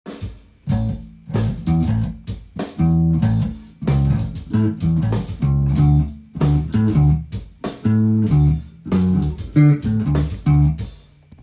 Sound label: bass line